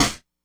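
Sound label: snare, Breakdance 172 B minor; Big Fish Audio; Hardcore Breakbeat Action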